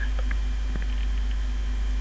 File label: silence